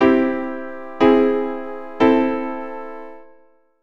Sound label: C plus chords, keyboard